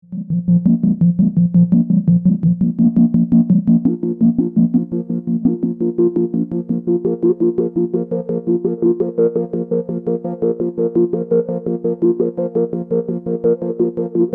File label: filter drive pat sweep